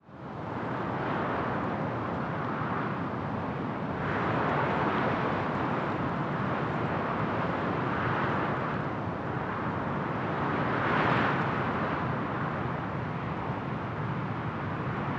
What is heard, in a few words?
ship engines; World Of Warships